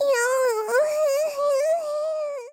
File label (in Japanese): 喘ぎ声カウント; カスタマイズ用音源